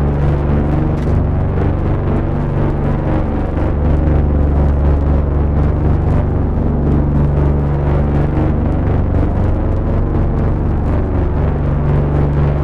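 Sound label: blue turbine loop distant; Titanfall 2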